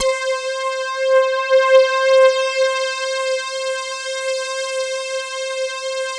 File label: MEG, synthesizer bass; Synthesizers; Soli-Music; World of Synthesizers VOL-1